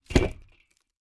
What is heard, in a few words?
wood iron place